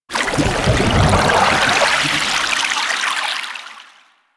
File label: Cannon Charge Up; Warframe; Warframes; Pirate